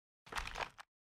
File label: bite